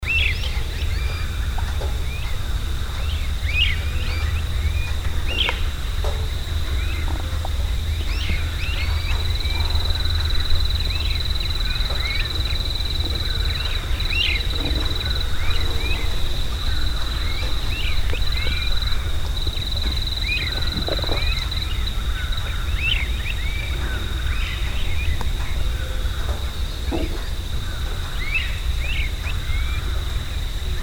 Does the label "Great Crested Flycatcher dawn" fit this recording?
Yes